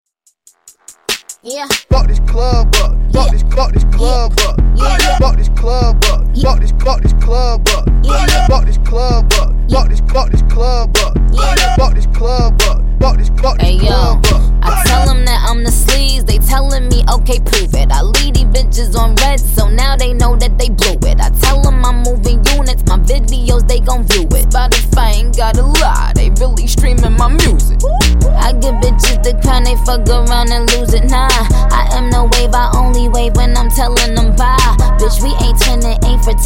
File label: Dj Intro Outro Clean Dirty 73Bpm